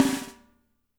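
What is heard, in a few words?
piccolo snare; Spectrasonics - Burning Grooves CD2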